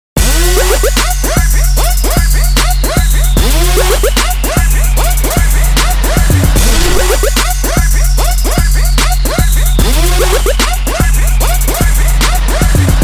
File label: Trippy break down